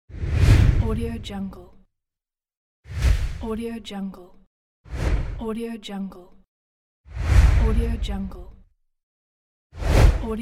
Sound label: whoosh